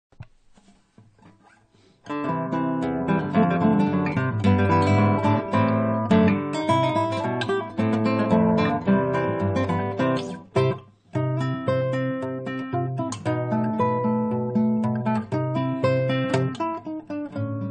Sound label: Gitar; Tom and Jerry